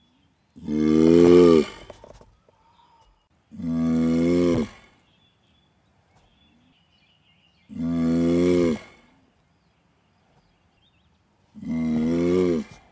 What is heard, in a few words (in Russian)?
morskoj lev